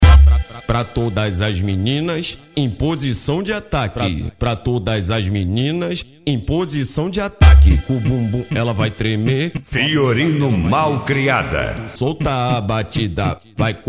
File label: mega funk bass